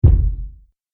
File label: Body Slam Impact, wrestling and struggling